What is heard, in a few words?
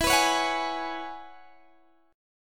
E 6th Add 9th E down Guitar Open G